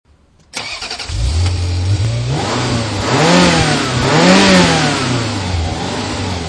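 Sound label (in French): Ferrari 348 point mort, sons de moteur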